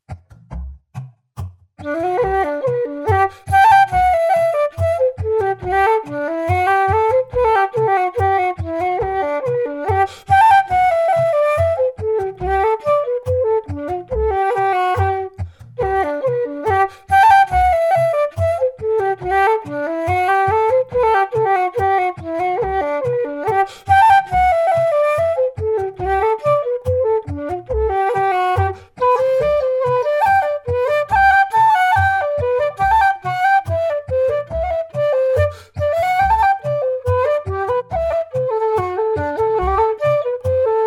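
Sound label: hornpipe flute bodhran; dunphys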